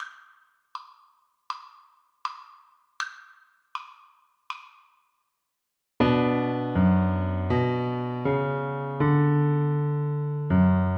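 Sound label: viola karaoke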